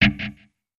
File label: Music Muted E Guitar